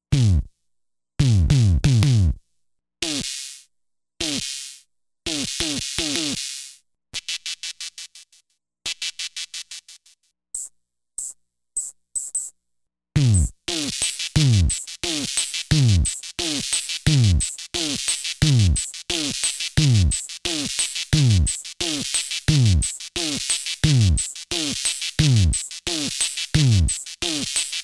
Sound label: raw beat quad analog drum synthesizer; dr zee workshop